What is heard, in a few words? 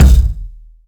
ambient bass drum d sharp; kick